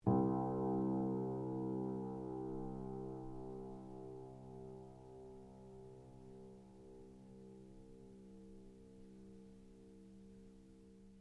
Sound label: PIANO UNTUNED C1 P, Baby grand piano left to fall out of tune; Pianos (Sound Effects:Musical)